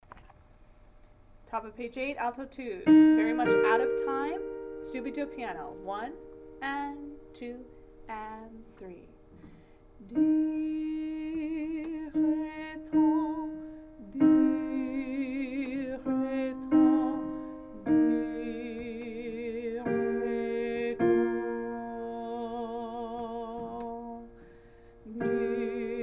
SSA Alto 2

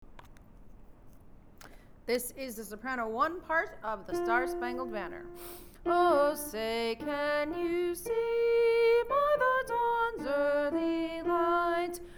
soprano; Star Spangled Banner

